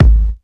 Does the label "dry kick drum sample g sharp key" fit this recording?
Yes